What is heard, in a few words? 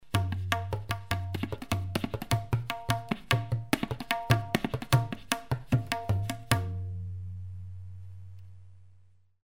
Tabla solo